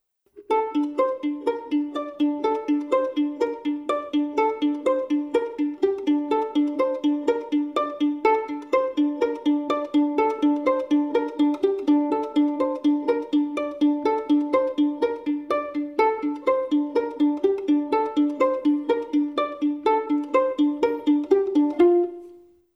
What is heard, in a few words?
PIZZ; BALTO